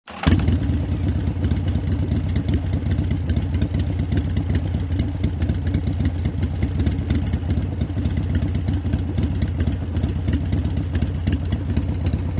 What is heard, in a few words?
Motorcycle starts, waits, turns off